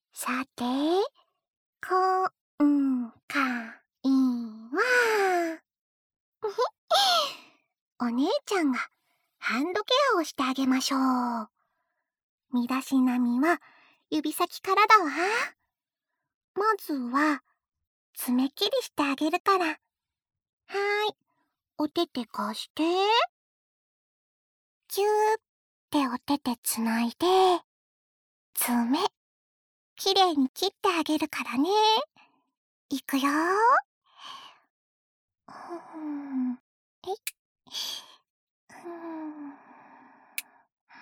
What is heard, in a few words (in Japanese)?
ハンドケア・マッサージ